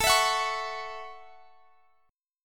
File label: A 6th A, chord